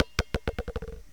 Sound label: Street Ball Bounce Concrete